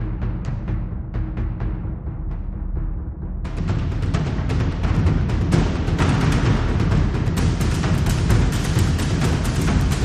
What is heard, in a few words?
Only Drums 2